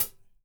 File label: CHH, HI-HATS; Spectrasonics; Liquid Grooves; LG HI-HATS